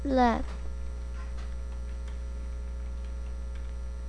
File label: laugh